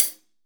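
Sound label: 12 HH CLSD, hi-hat closed; Best Service; Real Mega Drums VOL-1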